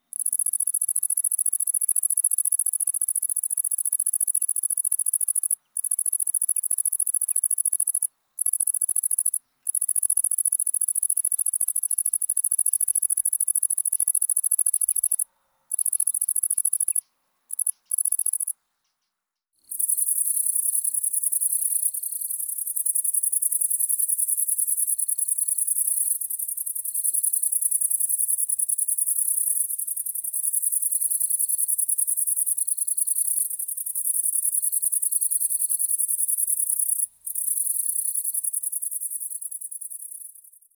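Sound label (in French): grande sauterelle verte tettigonia viridissima, stridulations; naturophonia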